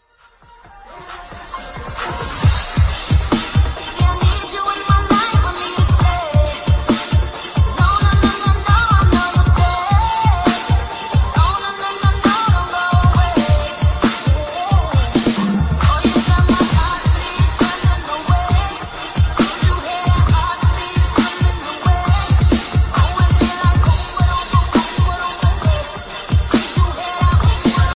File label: dubstep remix ringtone